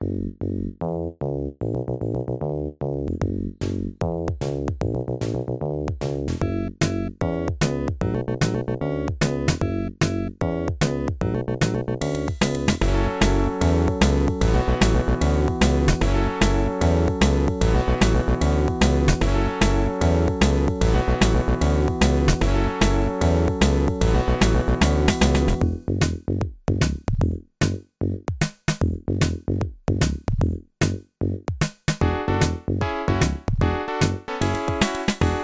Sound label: opl2 short thing, loops